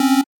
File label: Chord